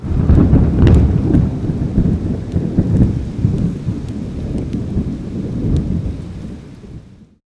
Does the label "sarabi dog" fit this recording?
No